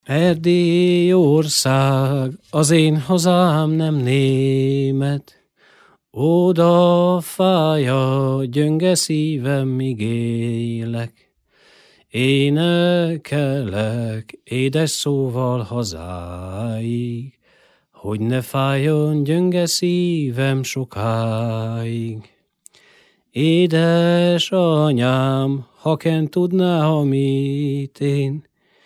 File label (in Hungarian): nepdal erdelyorszag az en hazam